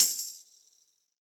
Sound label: Tambourine DeepSnareDynamics; Drum Hits; Standard Hits; Ableton; Session Drums Club